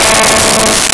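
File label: Over Drive Guitar A3; Nintendo; July 2020 Gigaleak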